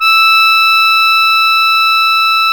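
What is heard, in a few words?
CONCERTINA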